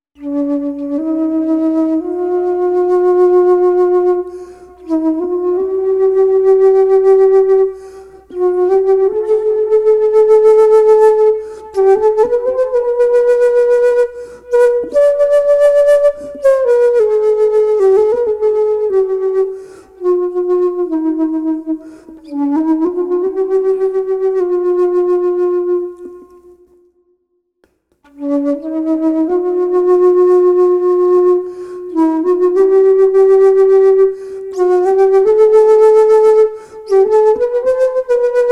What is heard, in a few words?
Flute